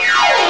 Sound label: piano play; ST-XX Amiga Soundtracker Sample Packs